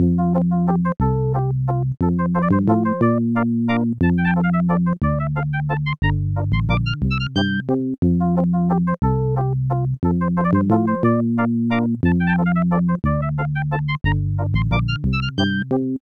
Flower Waltz; Putt-Putt Joins the Parade; 3DO, Android, iOS, macOS, MS-DOS, Windows

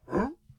Bush Antic; Mk Bush Antic; Hollow Knight (gamerip) (2018)

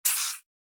Rattle Snake; gamerip

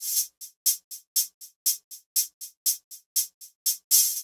hihat 8 beats

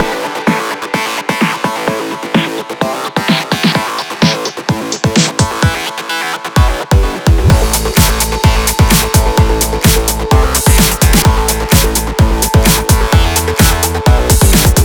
Acid style; Airtime Junkies

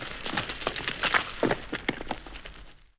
Rubble

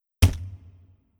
Impact Gore

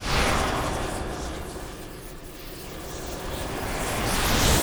Spell Pickup; Hollow Knight

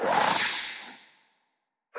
Ghost Run

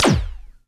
Small Multi Beam; battle suit aces

